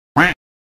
duck quack sound effect; hd; made with Voicemod

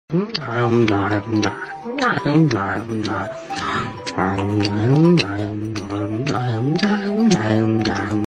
Eating out loud; meme

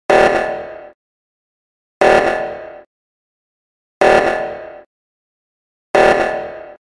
Among us sabatage sound

